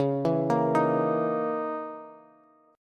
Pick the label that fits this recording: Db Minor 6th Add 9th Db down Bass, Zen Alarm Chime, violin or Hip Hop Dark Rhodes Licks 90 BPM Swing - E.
Db Minor 6th Add 9th Db down Bass